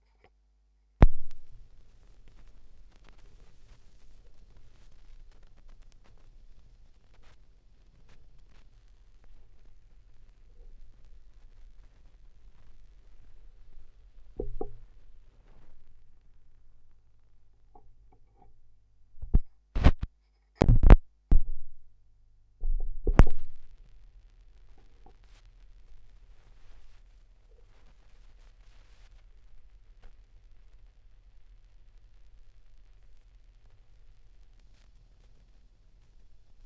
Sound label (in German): Schallplattengeraeusche, 16rpm; wendelrekords 1.2